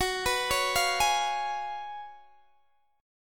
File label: Gb Major 7th Suspended 2nd Suspended 4th, Guitar DADGAD